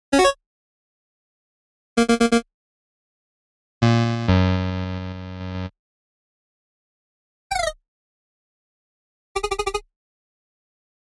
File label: Retro Games